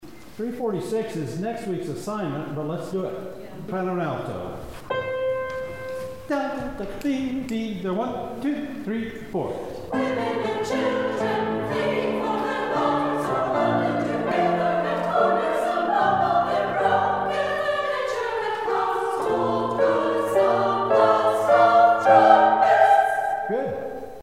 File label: FIRE SA